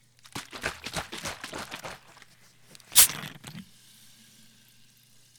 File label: Bottle Shake Open